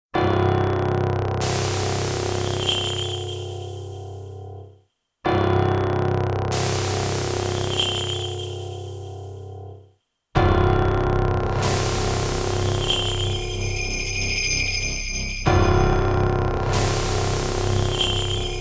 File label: prelude; Shin Megami Tensei IV; sound art collection